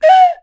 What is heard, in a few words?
bird calls, percussion; Voice Crystal - Steve Reid Definitive Percussion